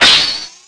Tir magique

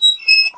Levier ou roue qui couine

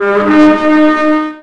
Trompette medievale

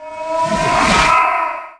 Fantome

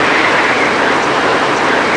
Tempete

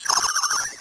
Cri d'oiseau

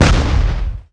Explosion

